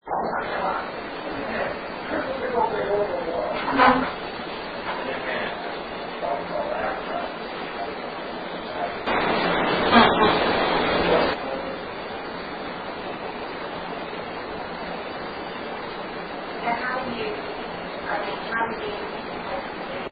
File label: um humm; Case 014 Henderson Home EVP